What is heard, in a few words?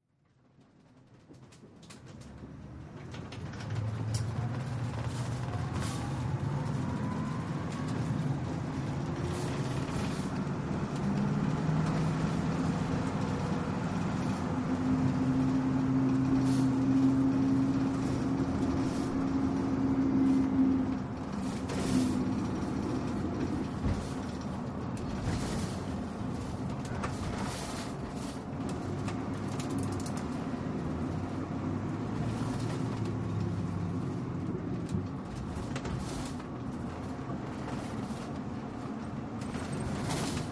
Ambulance Interior, ambulance